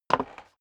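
step dress boots wood creak single stair up, footsteps; schritte; menschen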